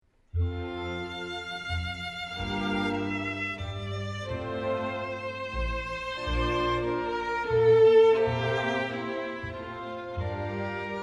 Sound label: Double Violin Concerto in D Minor